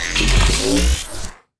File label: shoot start; weapons